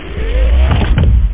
DOOR OPEN

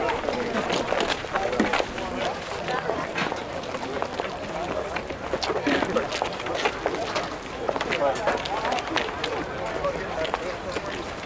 market crowd; DAoC

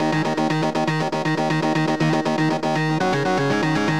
ORGANO

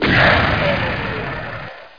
Doom 2 Explosion

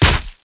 SW BODY; thief

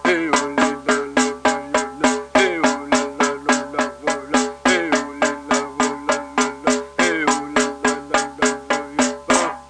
drm chnt, performs; WAV Sound Effects

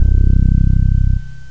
Fretless Bs

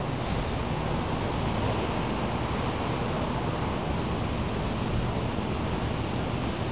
street

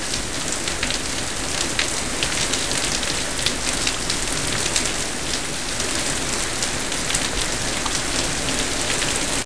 Sound RAIN; Spanish Net

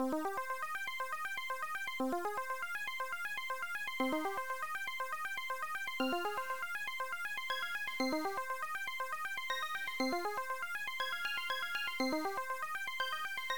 WDPIANO; AdLib MUS

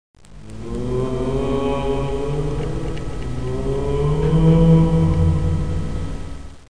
groan; Mr. Wonder's Greatest Toyshop on Earth; OmniMedia

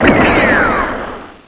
bomber

